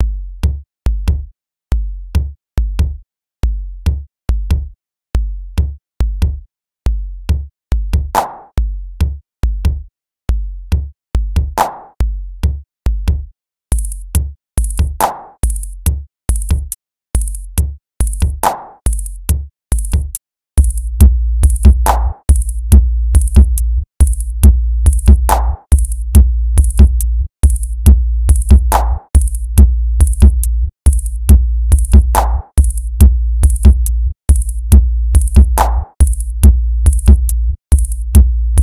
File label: drumbeat full